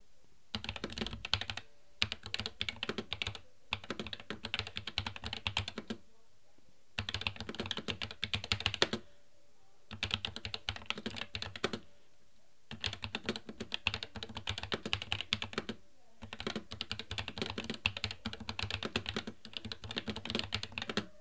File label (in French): enregistrement son asus rog claymore ii